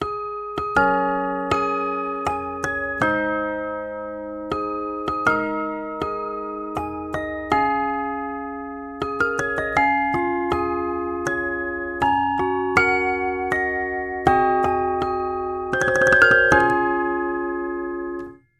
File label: Song Birthday; Cobalt Core